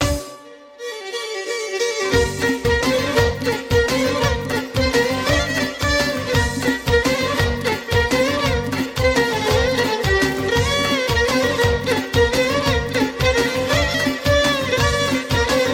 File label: G major bpm 114